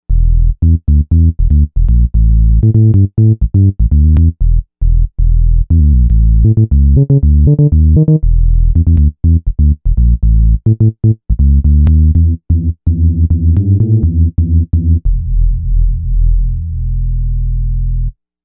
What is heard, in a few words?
Novation UltraNova; Factory Patches